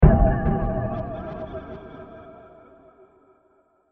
Mysterious With Delays; Miscellaneous Impact; Impacts; Crafted Signals

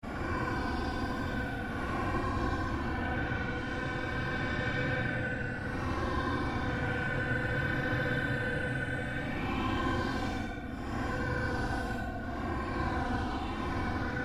Created Drone, Drone Sound Effects

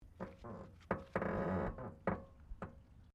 CAR MAZDA RX7 SERIES3 EXTERIOR DOOR CREAK; 1983; Cars; Vehicles

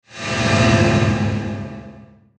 WHOOSH METALLIC, Whoosh Metallic Reverb; Blastwave

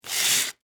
Sand Paper Wood; Industry, Sand, Paper, On, Wood, Single; Hand Tool Sound Effects

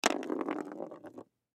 Chap Stick Roll On Table; Rolling; Foley; Blastwave

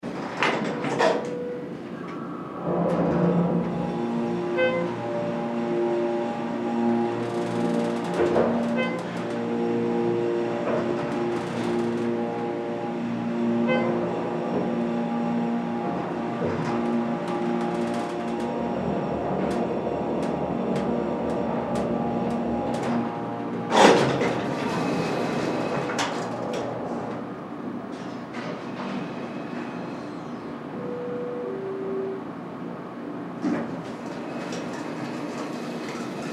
Elevator Doors, Elevator Doors Close; Elevator Doors Close Move Up Doors Open; Elevators; Blastwave

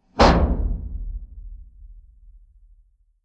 Old Metal Door Closed Latched Impact Stinger; Sound Design; Hit Sound Effects

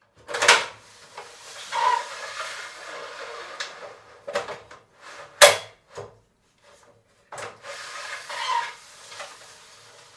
Loft Ladder Sliding with Locking Catches; aluminum light ladder; Miscellaneous Household; Tom Hutchings

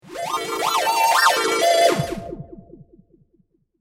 Twisted Theremin

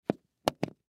Safety Goggles Set Down; Hospital Sound Effects; Surgeon Surgery; Emergency

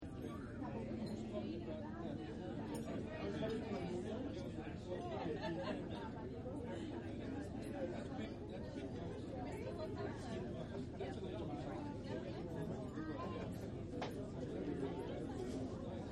Nightclub Ambience Only; Bars And Clubs; Backgrounds

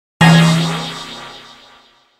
Logo Airy Sweeper Filter; Blastwave

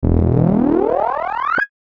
Synth Ascend Pop; Ascends; Multimedia; Laser, Charge, Production Element, Imaging Element, Accent, Transition; Blastwave

